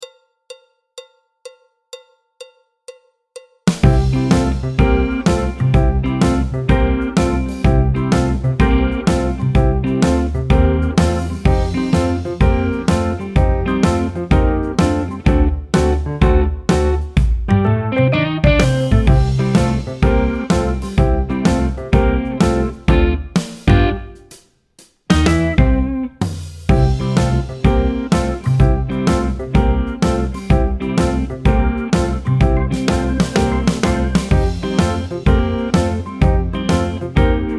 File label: tenor, clarinet, flute; Jazz Syllabus Level 2